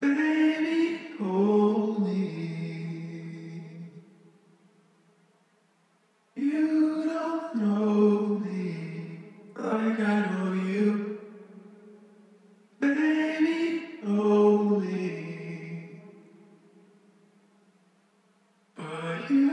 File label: Vocal - 150 BPM E Maj; Cymatics; Orchid Sample Pack; Vocal Loops